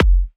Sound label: Hi Kick